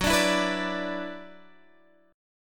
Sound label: Gb 7th Suspended 4th Sharp 5th Gb down Guitar Standard; strummed; JGuitar